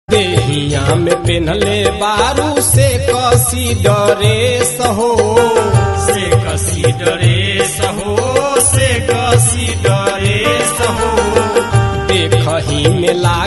FRESH BHOJPURI RINGTONE

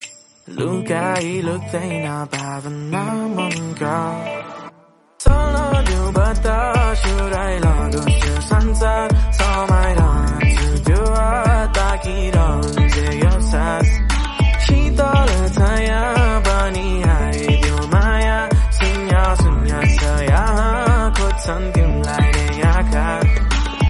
NEPALI SONG RINGTONE

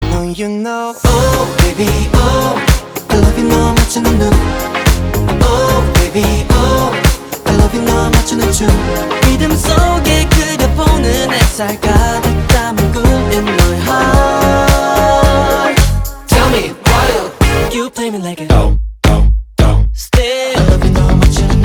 GUITAR RINGTONE

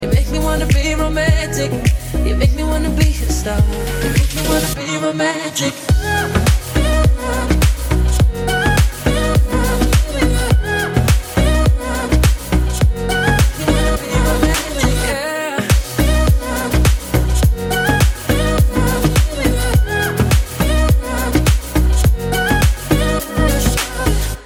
ROMANTIC RINGTONE

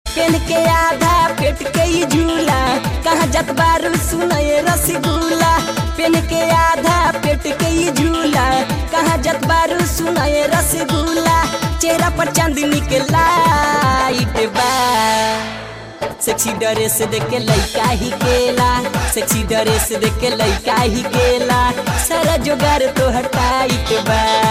Bhojpuri ringtone

